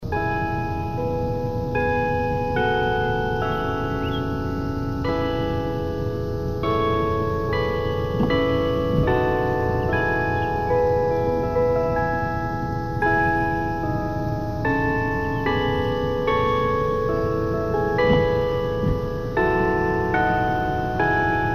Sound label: chimes